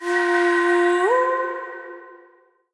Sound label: Win; genesis; clash Of Queens